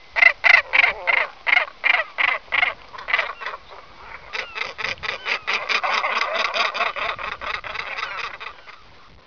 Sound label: Bird; 特酷声音素材库